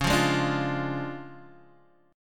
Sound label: D Minor Major 7th Flat 5th C sharp, guitar